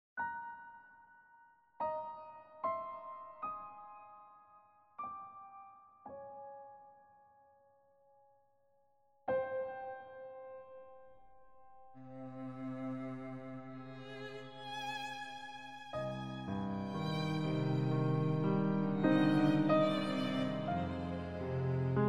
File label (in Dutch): Viool Cello en Klavier; A short note book sketch for Violin, Cello and Piano; Gevoel; Skets; dekker